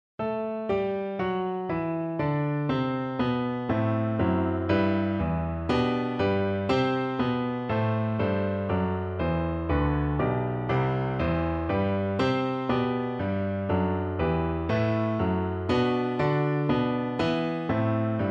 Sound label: personet hodie, Viola; Holst, Gustav; Piae Cantiones